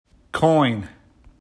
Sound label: Coin